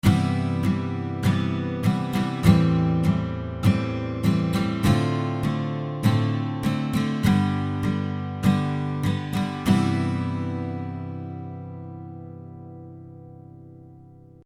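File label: Guitar rhythm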